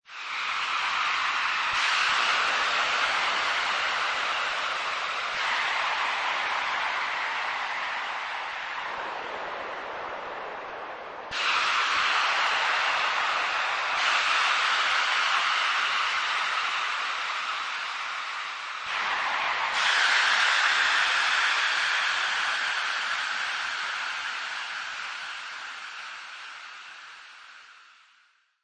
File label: Hostile Planet Ambience, the sound of aliens or other animals; Ambience, the sound of aliens or other animals in a stange planet, similar to bats in a cave; Science Fiction Sound Effects; Lynne Music